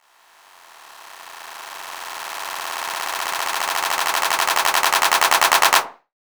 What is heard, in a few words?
CLAP FX